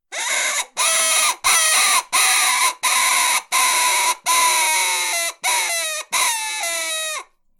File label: Mean Raspy